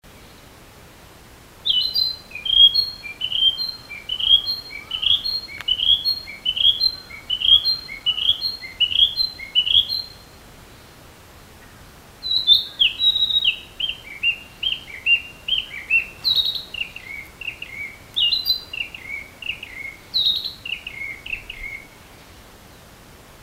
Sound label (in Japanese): kibitaki